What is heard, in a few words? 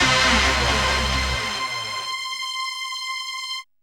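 Rave Synth, Dance Mega Jungle Rave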